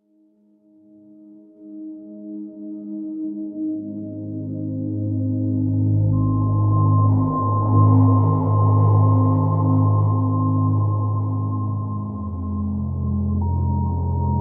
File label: Soft Rain Slow Heart